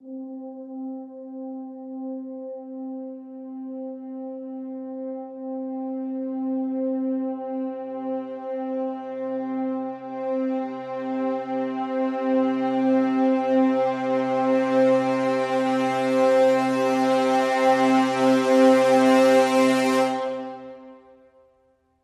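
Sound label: Crescendo Brass Swell Hi (C)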